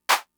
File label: Clap, Fricke MFB-522, Drum Machines